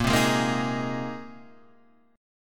A sharp 7th Suspended 2nd Suspended 4th, Guitar Standard chord; strummed; 6,3,6,3,4,4; JGuitar